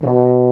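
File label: brass baritone B1; Roland - LA Composer vol. 1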